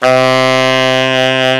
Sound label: TENOR SAX; Alpine Volksmusik